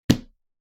内側から壁を叩く音

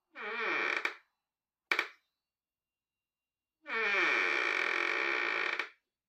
ドアがきしむ音; door

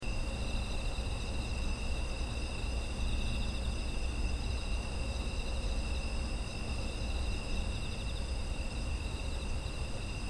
秋の虫の環境音

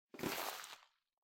ゴム手袋を取る音

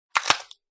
骨が折れる音

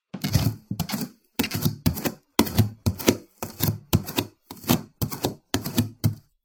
フローリングの上を裸足で歩く音

